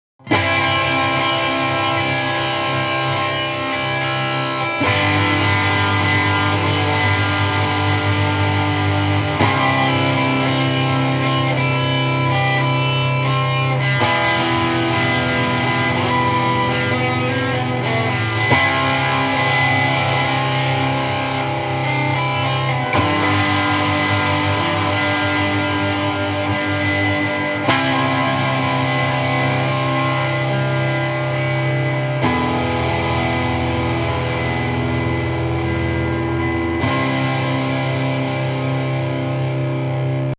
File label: sweeping and pondering